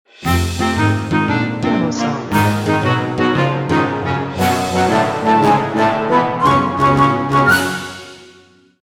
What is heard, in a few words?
A Lively Playful Mood